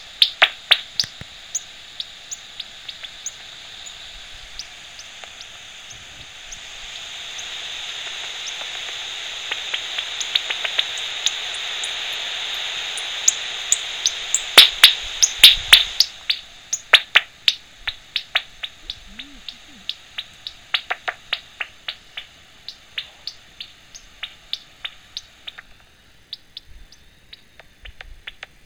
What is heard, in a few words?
beat boxing bats